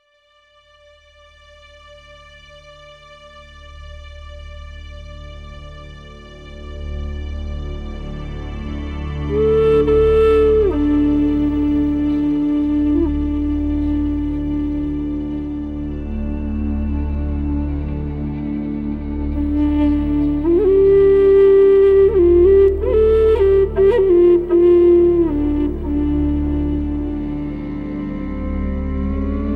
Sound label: Mystic Nature, Wood Flute; nordamerika